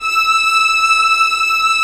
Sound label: Vla E5; Digital Sound Factory Virtuoso